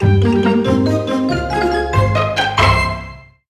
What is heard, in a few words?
Level Success; luigis mansion dark moon; 3ds switch; Haunted Towers